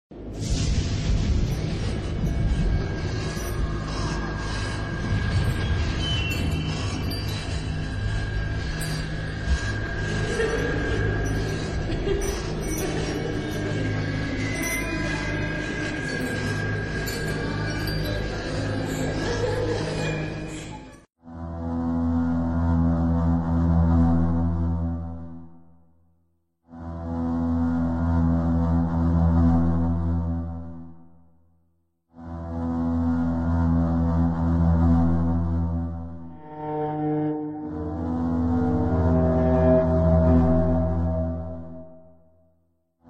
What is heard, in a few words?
fundo musical suspense terror horror themes; musicas de terror